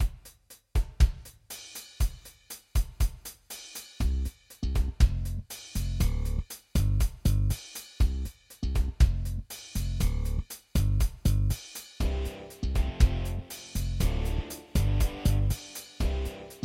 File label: rock band test